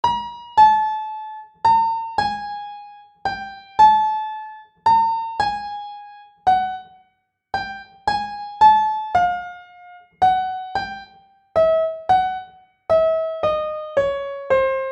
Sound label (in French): Adaptatio proposition (insensee) de la trompette nonoctave 84,46 cents 56 bpm